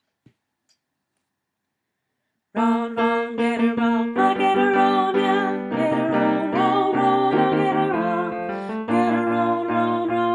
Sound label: Sopran